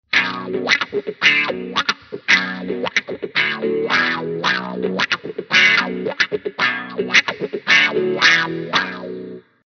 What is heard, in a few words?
VOX WAH V847 A Clean